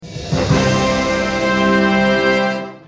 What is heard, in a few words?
victory